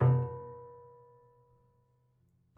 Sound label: Prepared Piano